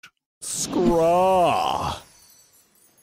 Scraw

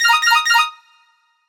Chord

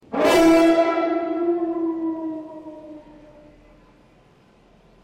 Horn; Gjallar Horn

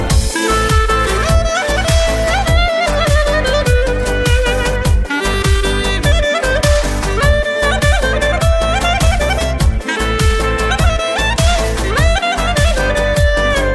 Caucasian Clarinet; Caucasian Clarinet B

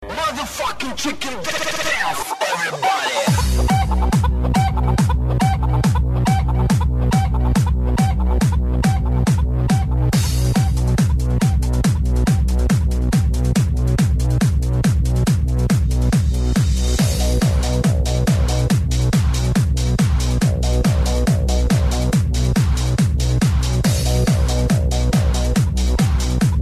Chicken Techno

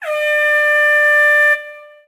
Airhorn

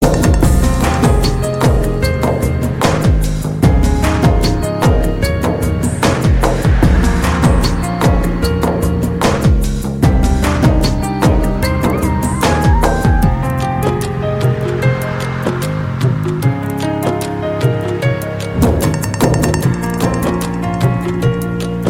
Rabba Music

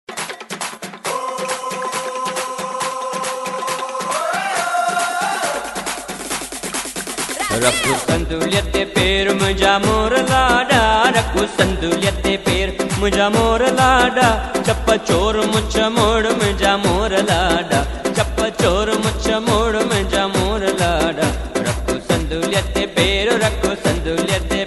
Sindhi Remix

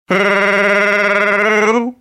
Roar

